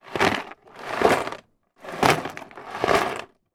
foley small plastic items tip movement in small card box; zapsplat